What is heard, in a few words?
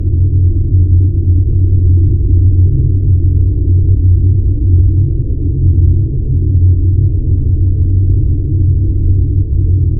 sound of spaceship